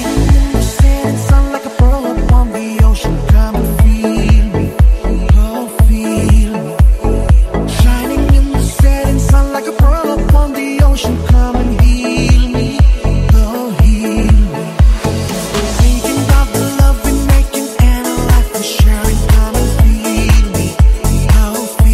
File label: DeepHouse, ringtone